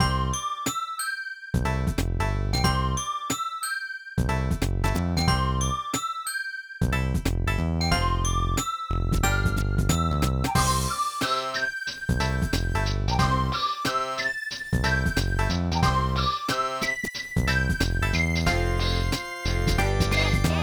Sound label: CASUAL BPM91; Scribblenauts